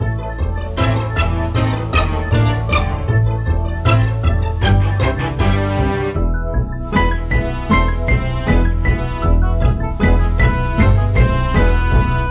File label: Level Theme; Manic Miner; GBA